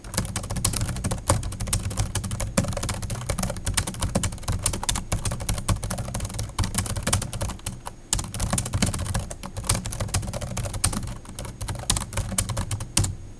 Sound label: Trust KB-1450; Xmas-KB-samples